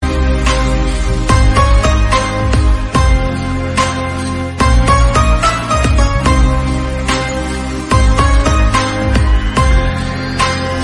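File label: background music; Sooryavanshi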